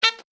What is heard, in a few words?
Sax Beep